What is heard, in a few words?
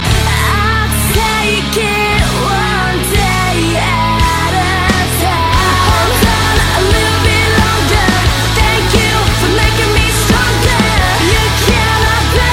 Stronger Ring